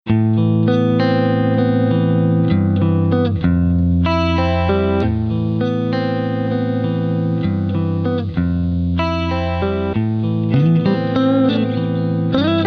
Fender Strat Rocktron Sacred Fire Hard Wire DL8 Loop Roland JC120